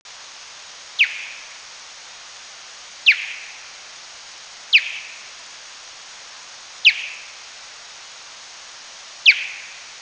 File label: Plecotus auritus